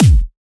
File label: Trance Kicks, Bassdrums; Vengeance; Essential Clubsounds Vol.4